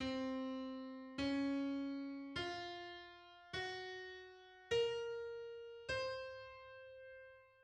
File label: Iwato scale on C